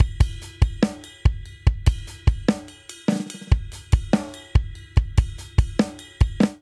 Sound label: Heavy Rock Outro 145 bpm; MIDI Clips; Ableton; Factory Packs; Drum Booth